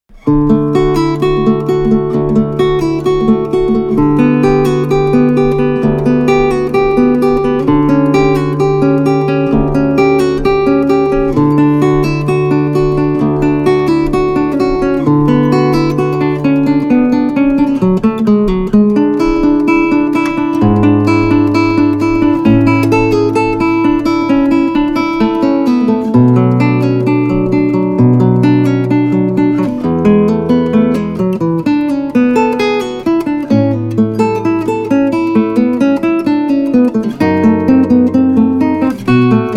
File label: Guitarist, Spanish Guitarist